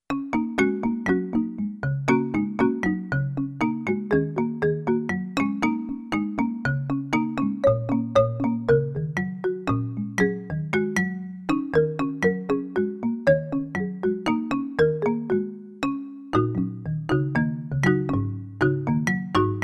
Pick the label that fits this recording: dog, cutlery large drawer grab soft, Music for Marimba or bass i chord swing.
Music for Marimba